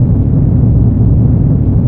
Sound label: inside drone; Dystopia; cybernetic